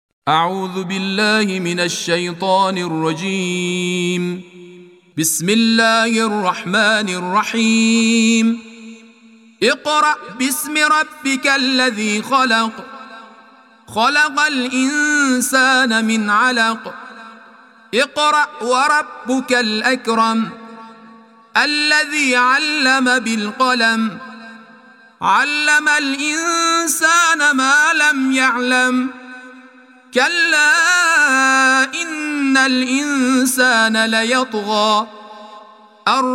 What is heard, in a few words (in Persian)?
تلاوت ترتیل قرآن کریم سوره علق